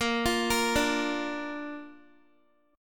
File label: Bb Major, Guitar; arpeggiated; x,13,15,15,14,x